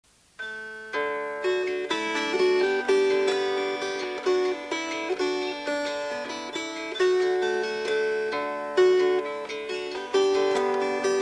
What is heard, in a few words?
Fingerpicked